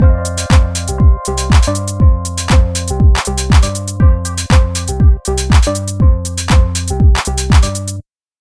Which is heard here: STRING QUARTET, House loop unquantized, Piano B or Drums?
House loop unquantized